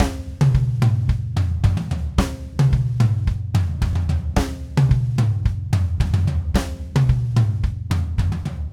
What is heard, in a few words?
Red Maple Groove 110, DW Red Maple Kit